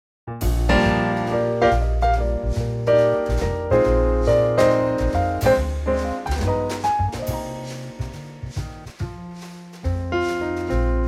Eb swing

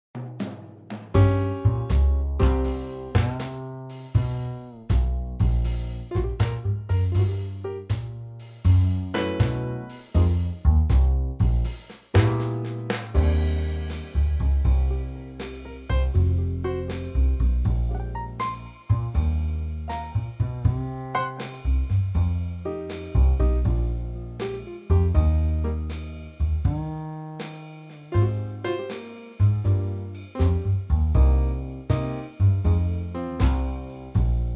trio; key Eb - vocal range - C to F

